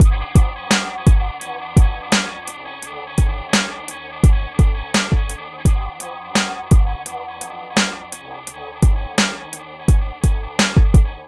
PHATT BEA

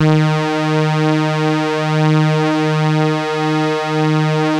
TARK SAW, Synth

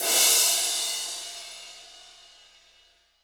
KOMMERZDRUMS; Soundengine - CSR-000 The Art of Transwaves

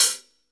HAT, Hi-Hats, HH Modules; Roland L-CD701

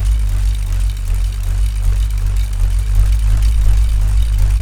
BULLROARER, Primitive Instruments

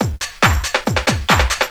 DRM, LOOPS 140, House; Best Service ProSamples vol.10 - House; AKAI

